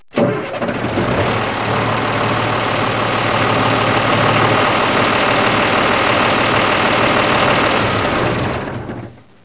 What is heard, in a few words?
vehicle